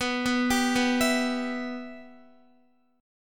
B Suspended 4th Sharp 5th B down Guitar, Bsus4#5 chord; arpeggiated; JGuitar